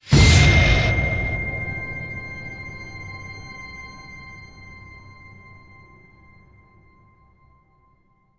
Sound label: sting Tense